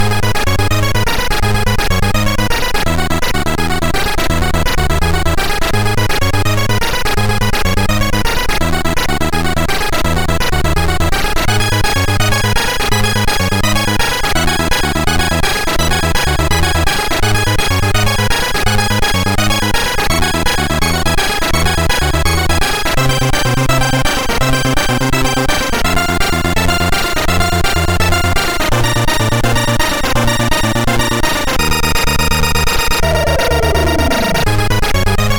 sid, MOS6581R2

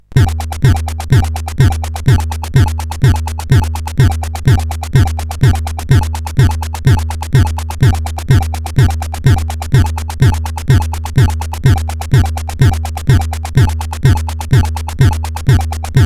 Acid, CSG8580R5

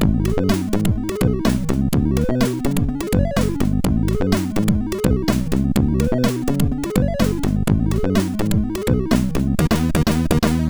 Synthfunk, sid MOS6581R4

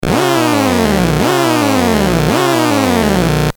CSG8580R5, GAMES; Tron

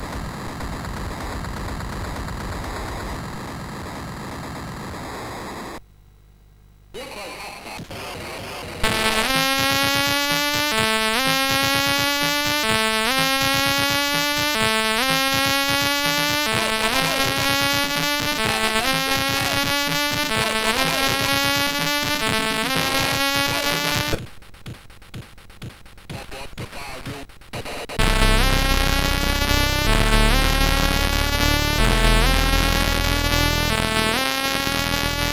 Moving In, CSG8580R5

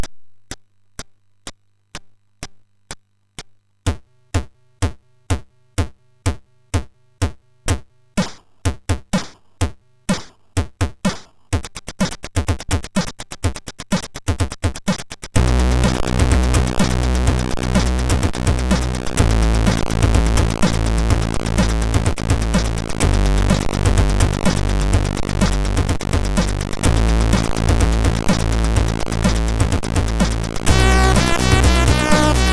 New Tune, MOS6581R4

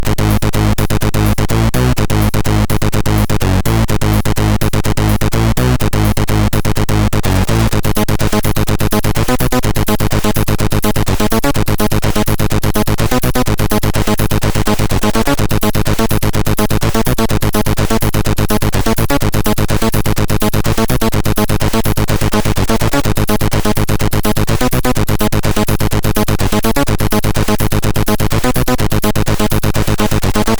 Techno, MOS6581R3

